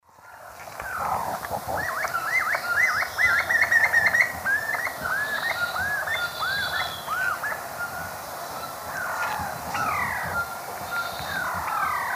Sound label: Notharchus hyperrhynchus, calls; S. America; Eastern Lowlands